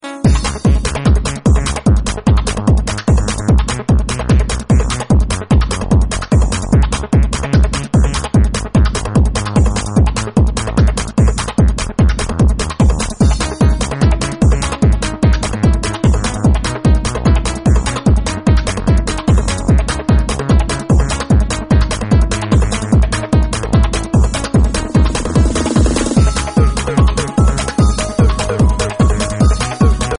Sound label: instrumental remix